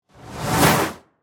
Whoosh Transition, Fast, Bright, Accent, Transition; Production, Element, Imaging; Whoosh Sound Effects